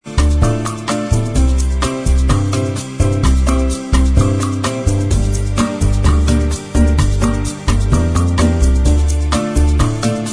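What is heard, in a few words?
bossa mix instrumental track, backing tracks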